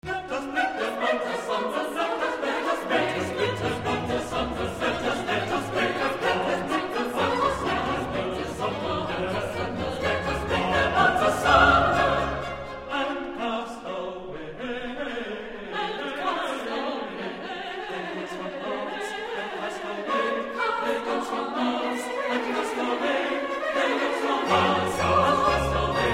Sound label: Let us break their bonds asunder - Chorus; Boston Baroque; Handel Messiah